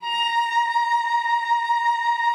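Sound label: A#2, cello vibrato; Xsample VOL-7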